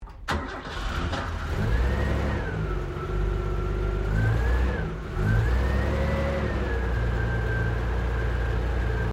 CAR HOLDEN HD PREMIER IGNITION, Classic Australian cars